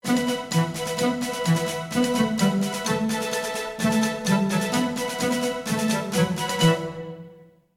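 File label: News Flash Underscore instrumental; camp musicfully 2025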